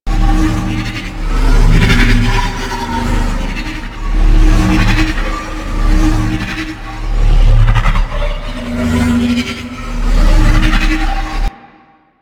Moon Artifact Large Loop; Warframe; Ambience; Orokin

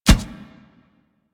Step Metal, Footsteps; Enemies; Kubrow; Warframe

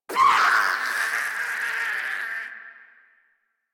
Death; Grineer Jester

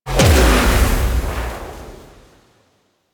Song Bubble Shockwave; Enemies; Abilities; Warframe; Teralyst; Bigalyst

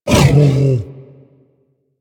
Juggernaut Truffle Found Initial; Warframe; Infested; Truffle Hunt

